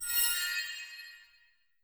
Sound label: UI ITEM MINOR; adams venture origins